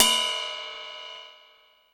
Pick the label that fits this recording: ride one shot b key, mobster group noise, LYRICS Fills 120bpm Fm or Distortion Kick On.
ride one shot b key